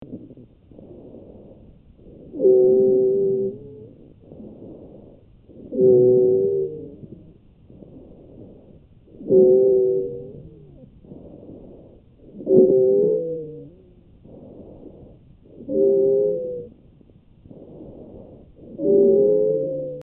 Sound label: Asthma